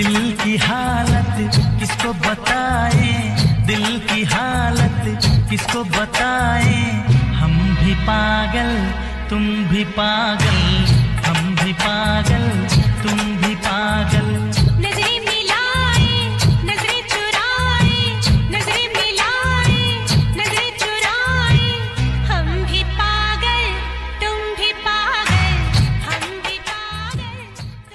female version sad love ringtone, bollywood

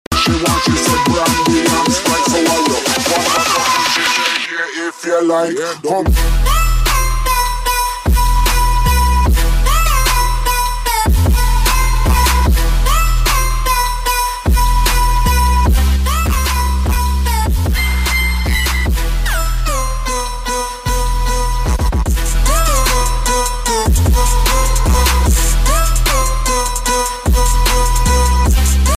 trance ringtone

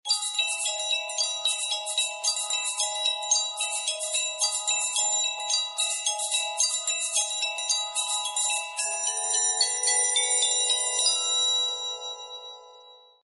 s4 ringtone; blowing dandelion

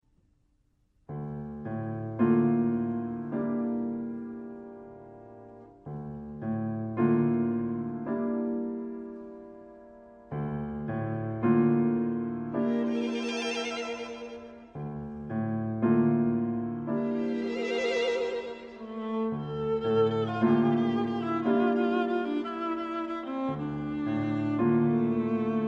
piano version

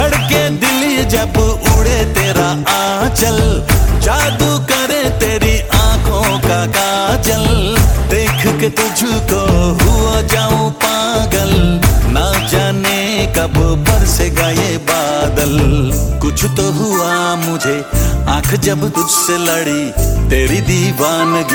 hindi song ringtone

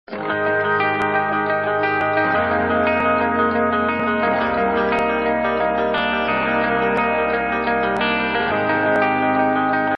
beginning riff ringtone

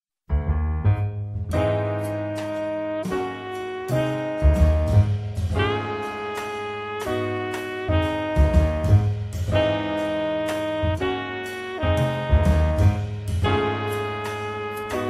wow gospel ringtone

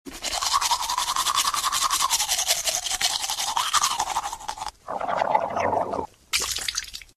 teeth brushing ringtone; iphone sms ringtones

